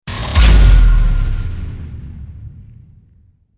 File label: launch hit big